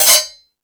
Discoball, Drum Machines E-MU Pro-Cussion

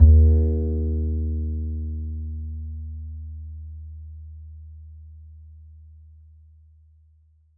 E, E string upright bass; Larry Seyer

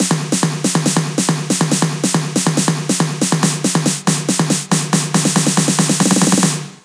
Fills, Loops 140 BPM

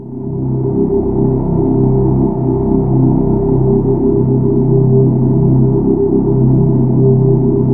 JV TRON CHOR; VINTAGE-EXP

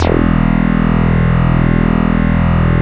XFM BS, FM Syn Bass, Synth Bass

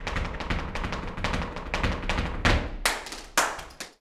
Flamenco Tapping Zapateado Solo; Best Service ProSamples vol.46

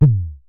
Synare Filter; Star Instruments Synare 3

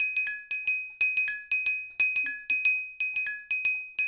Vibrottles

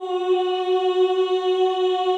CHO F#3, CHO Women; Sound & Vision - Gigapack I CD 2 (Roland)

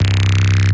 bass fathoms chirpy fm; Polyend; Recue

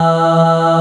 CHORUS E3; CMP - Analogon; TREMOLSKI

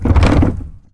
Foley lo; layering

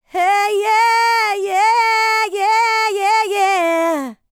LICKS, Vocal Planet, R&B; ILIO; Dance; LT R+B

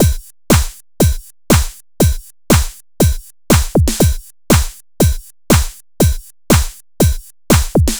120 trance beat, Drum & Perc Loops; Trance Explosion Vol1

